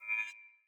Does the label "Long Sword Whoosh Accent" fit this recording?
Yes